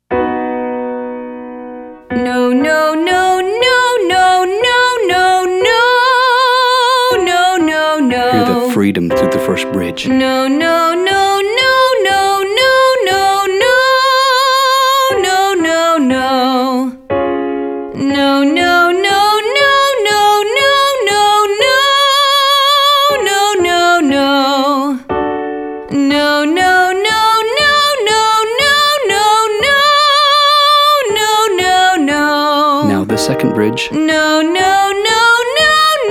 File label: Exercise No No No female; singing lesson